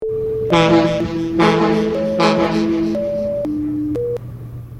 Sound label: Fog Horn short triple